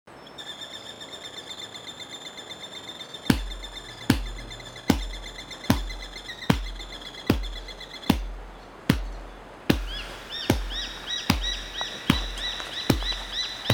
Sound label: Shallow bird soundscape